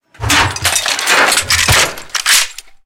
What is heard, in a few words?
reload cannon 20mm